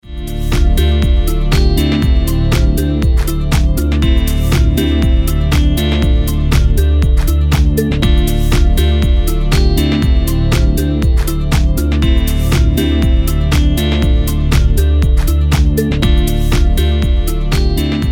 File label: Warm Synth Sounds with Presence DRY; multimode exciter